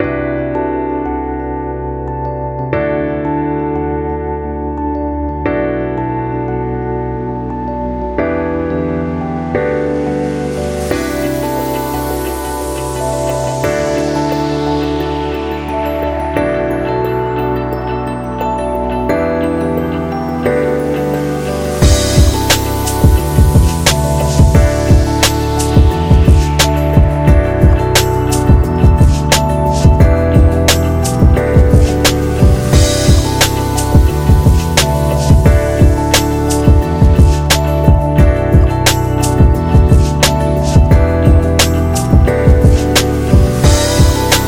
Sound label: Luxury Soul